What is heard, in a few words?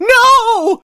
Scared Male; friday the 13th killer puzzle